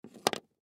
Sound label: Pencil Set Down Desk; Office; Blastwave